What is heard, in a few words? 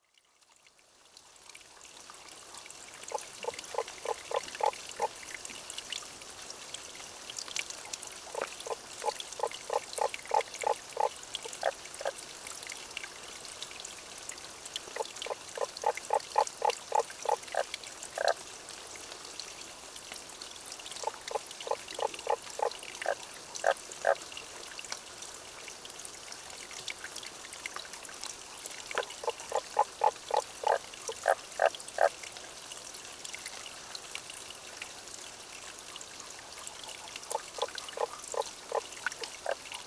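Tago's brown frog; tagogaeru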